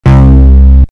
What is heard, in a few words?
censor